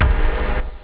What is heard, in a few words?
hit, stabs